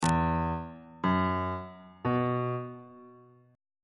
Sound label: e suspended 2nd triad chord intervals on bass clef